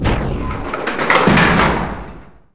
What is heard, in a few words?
Door Close; Sotha